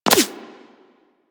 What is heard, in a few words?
Laser Impact Flesh; Warframe; Weapons